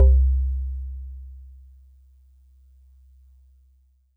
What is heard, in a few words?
Celeste DX100 C1; Pristine Celeste